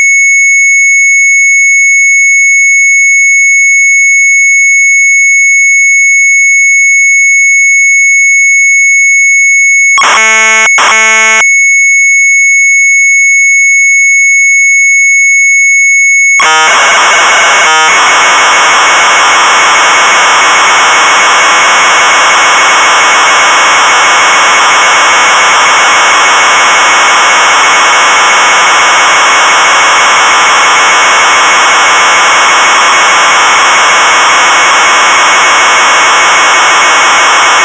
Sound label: MZ800 Tape, sharp; retromelsarchive